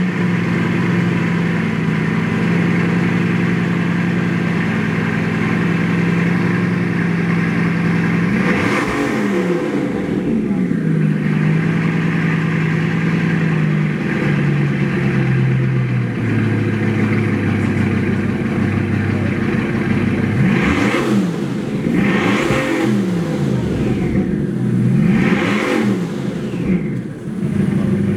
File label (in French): Bugatti Chiron Son moteur